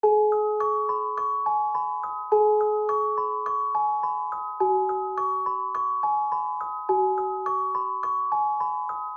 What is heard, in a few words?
Short Gothic